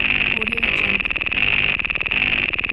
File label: scifi spaceship interface mechanical survey machine loop